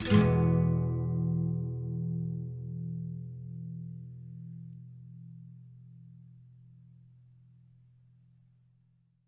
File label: Nylon Chord E maj, guitar; Nylon Guitar Chords